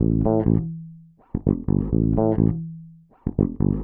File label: DEEPHOUSE BASS 125 A